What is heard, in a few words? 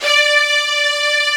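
strings section D4 right; STRNGS; AKAI S-Series CD-ROM Sound Library VOL-8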